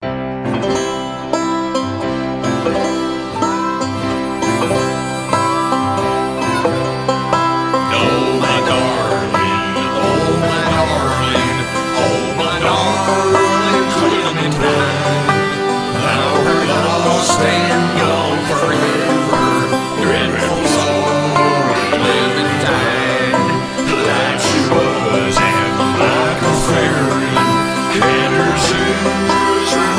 Oh My Darling Vocal

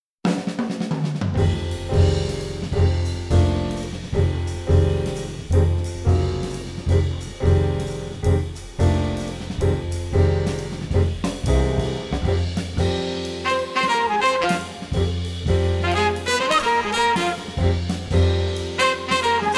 Blues; Barcelona

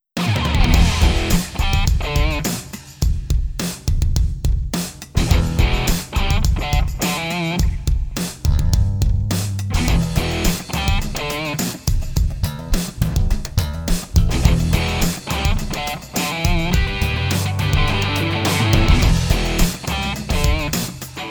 Big Funking Rocky Deal

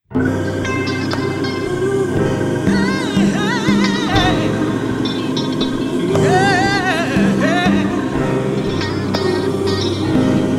Motherless Child Vocal

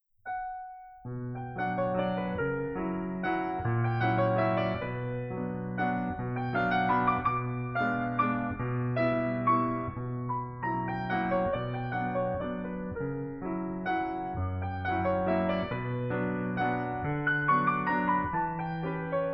Waltz Opus 69 No 2 in Bm; Chopin

